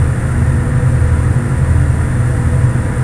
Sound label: ice, ambience; kejim